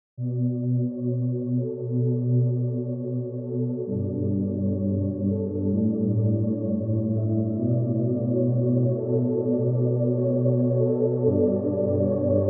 gated trance pad with light

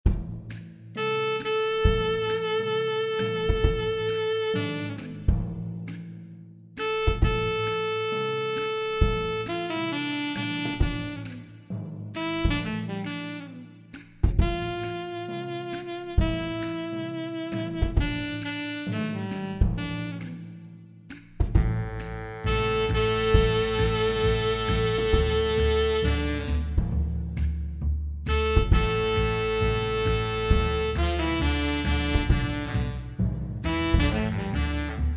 sax; the pirates

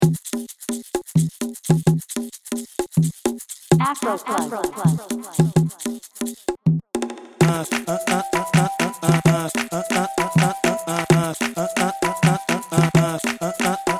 afro keyz baile funk

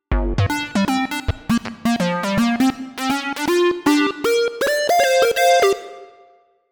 Jupiter 8 pulse poly; opx pro3

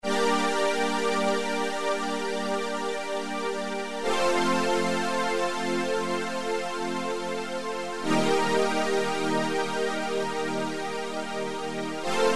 strings

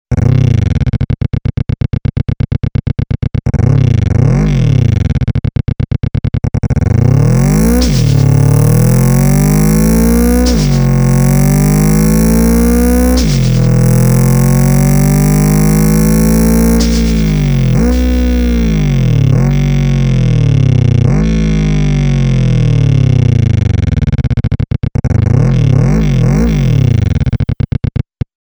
turbo h4

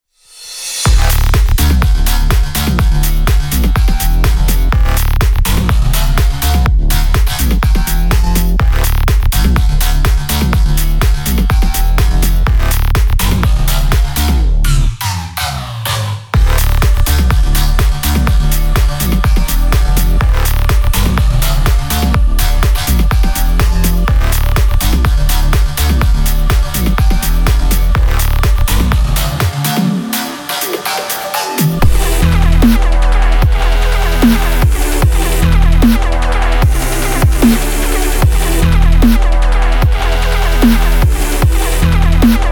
bass expansion

